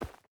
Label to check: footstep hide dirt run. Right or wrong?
Right